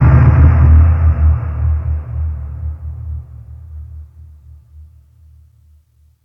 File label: shock; horror